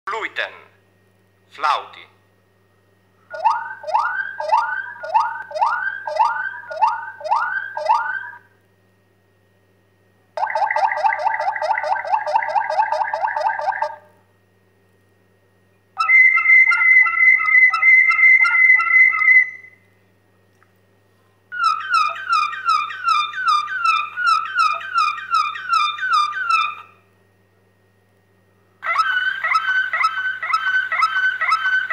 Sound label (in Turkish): Fluiten, Malinua Kanaryası, Fluiten, ıslık sesini andıran tiz ve keskin ötüşlerdir; Özellikle solo ötüşlerde dikkat çeker ve kuşun melodik kabiliyetini yansıtır